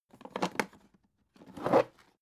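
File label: aluminium case small handle drag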